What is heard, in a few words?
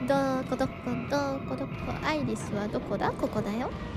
doko jingle; IRySJP